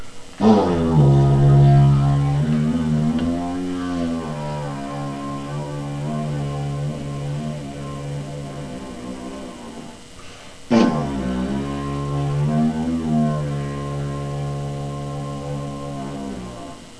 giant didge

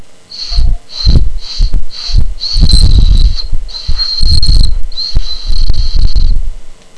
bamboo whistle

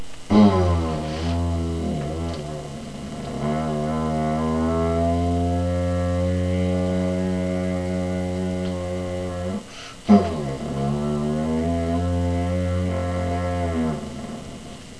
bamboo didge

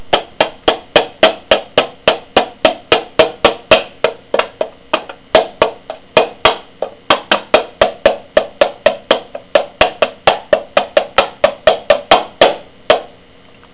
yoghurt drum

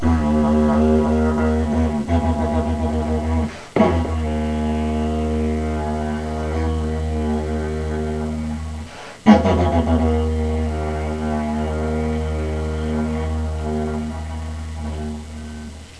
long bamboo didge

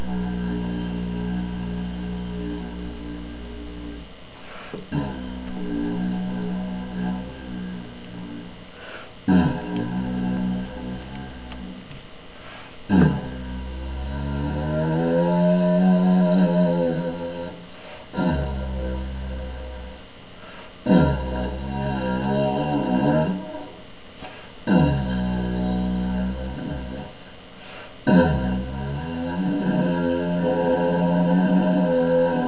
new bent pvc didge

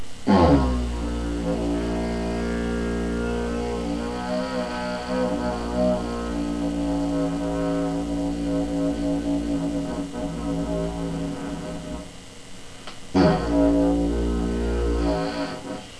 five foot didge

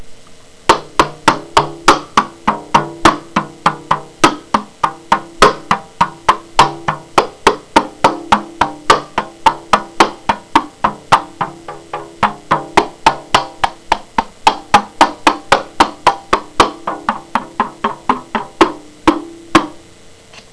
terracotta drum

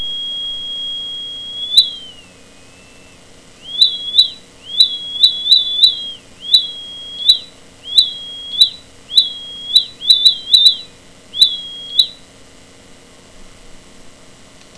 beep music

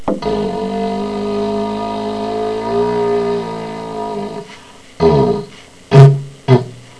cardboard didge

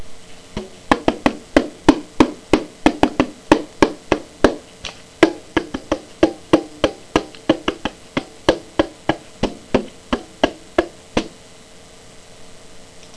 mini drum

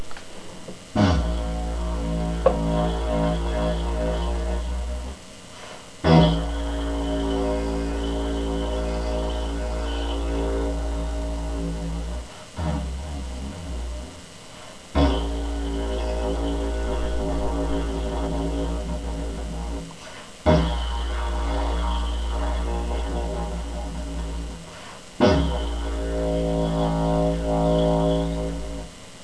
new long didge

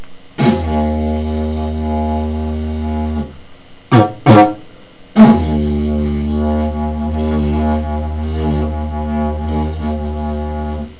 double didge

